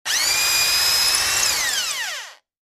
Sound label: Electric drill bores holes in wood at variable speeds.; Tools, Hand Drill Motor, Drill